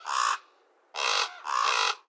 Ambience Menu Crow; subway surfers city sound effects; Android, iOS

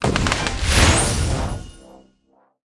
Board Credits Added; subway surfers city sound effects; Android, iOS

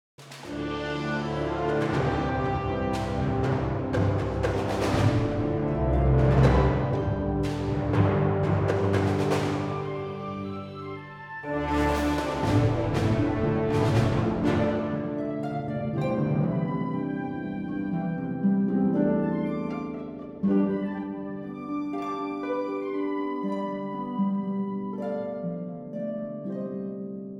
Cinematic

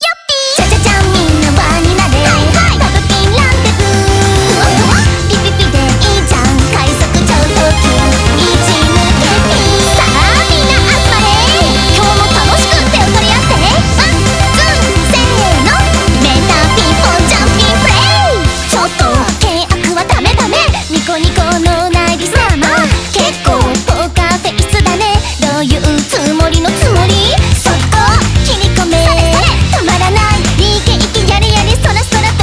BGM Reach

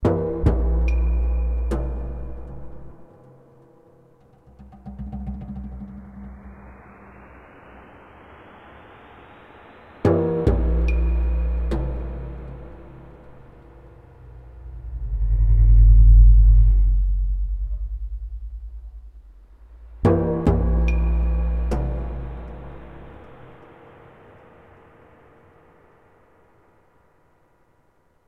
Amb Indoor

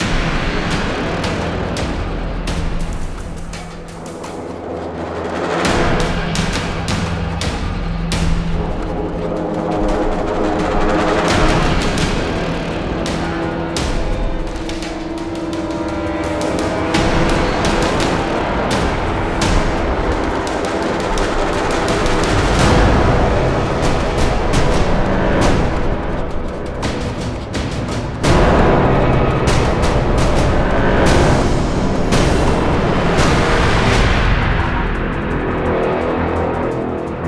Base Loop low

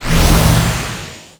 Enemy Skill Barrier; Disney Star Smash; Android, iOS, Mobile

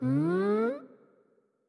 Wind Up; Winkubus

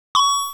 Ding; Malware

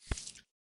Grab Limb; lethal company

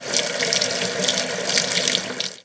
Spit

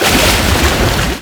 Enemy Shellwizard Gimmick Ballin

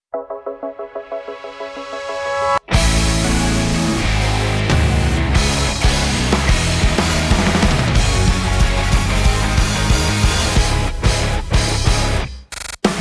Off Vocal; Death End re; Quest Code Z; PS5, Switch, Xbox Series X/S

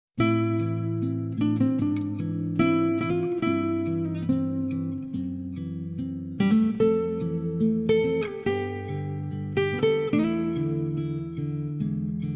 Acoustic Guitar version; Rurouni Kenshin Meiji Kenkaku Romantan

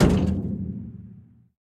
Weapon Metal Heavy; Going Under

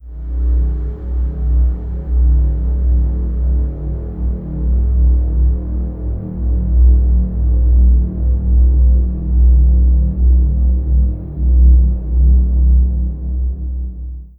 cutscene stinger

